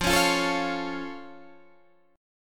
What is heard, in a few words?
F Augmented Guitar Open D